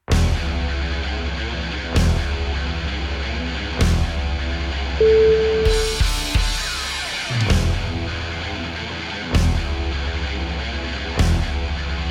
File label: punks rock